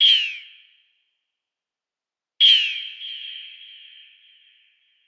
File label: Eagle